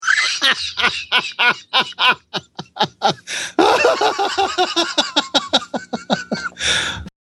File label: laugh